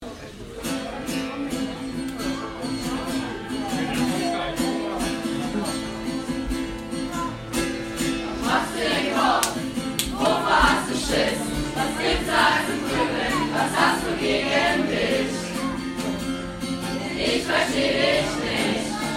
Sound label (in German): Chor Schulband; Schulbandtreffen